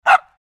Small dog single bark

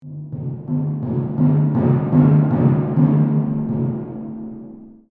Cartoon funny timpani

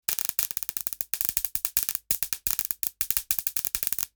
Electrical zaps electricity sparks; sound design effects

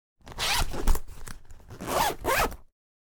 Makeup bag zipper open or close

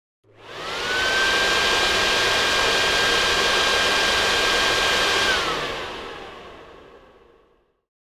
Lawn sweeper engine noise exterior garden; household

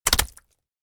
Cartoon splat hit multiple splats squelchy

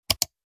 Old mouse click

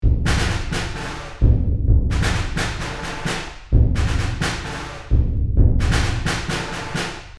Field drum marching loop

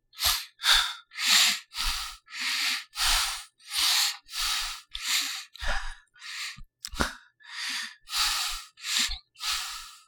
Male vocalization tired heavy breathing gasping

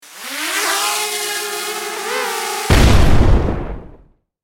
Military drone flight and explosion; style 3 short

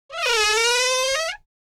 Old scary wood door opening

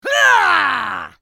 Mobile game character power attack; video game

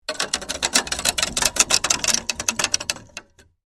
Rusty gears mechanical rattle

